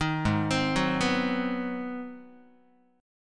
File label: Ab 7th Sharp 9th down Guitar; arpeggiated; 6,4,3,4,3,x; JGuitar